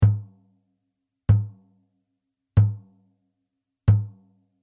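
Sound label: BASS Ctd Bury Beater, bass drum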